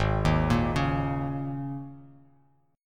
G sharp Suspended 2nd Suspended 4th, G sharp, down, Bass Standard; G#sus2sus4; arpeggiated; JGuitar